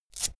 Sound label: velcro open cap fast